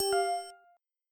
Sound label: warn